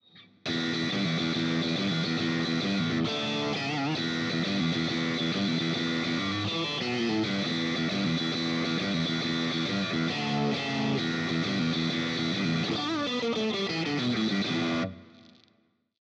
Neck Middle Drive, electric guitars; Gusta GST Standard; gst models

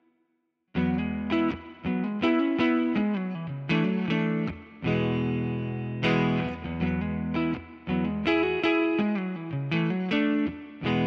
HSS Neck Clean, electric guitars; Gusta GST 05 HSS; gst models

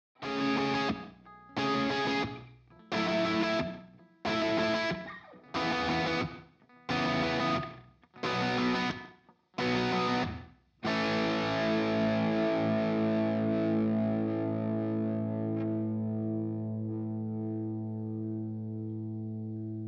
bridge drive

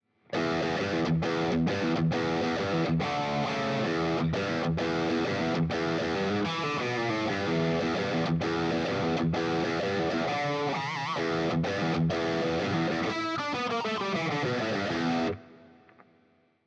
Bridge Drive, electric guitars; Gusta GST Standard; gst models

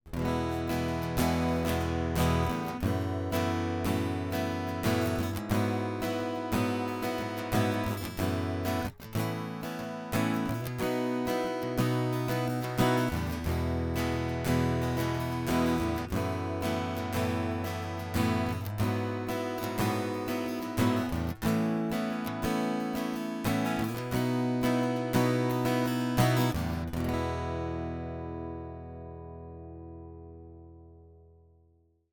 Direct Guitar Strumming; GDX 1C RW

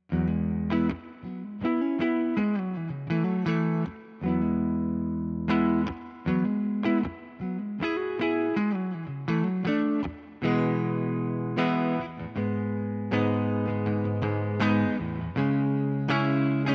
Neck Clean, electric guitars; Gusta GTL05; gtl models

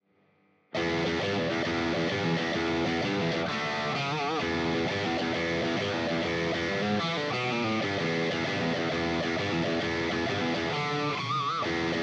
Bridge Drive, electric guitars; Gusta GTL05; gtl models

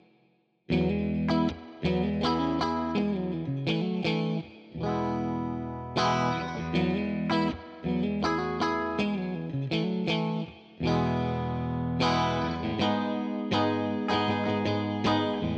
Mid Bridge Clean, electric guitars; Gusta GST Standard; gst models

